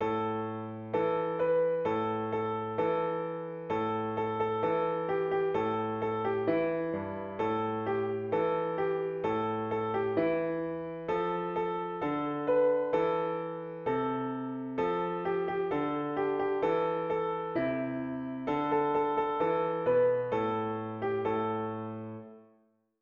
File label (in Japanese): ピアノ